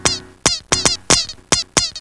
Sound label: dog pants; GEOGRAFIA